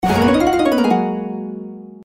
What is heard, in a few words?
win; Royal Demeanor